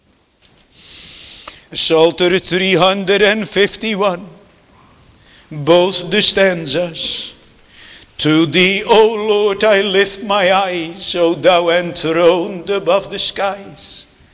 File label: Singing